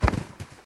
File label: zombie falling; Plants vs. Zombies